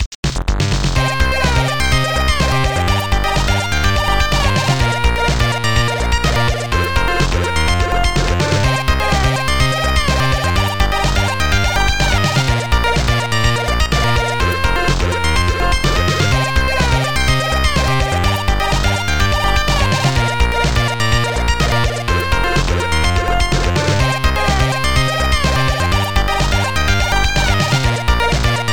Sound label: KEYGEN MUSIC RINGTONE; BEST ADDRESS 2003